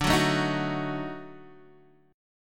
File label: E flat Minor Major 7th Flat 5th, D